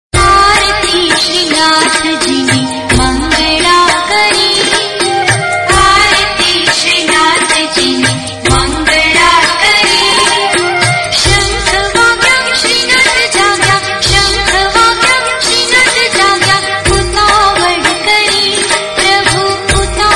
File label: aarti; sreenathji ni; alternative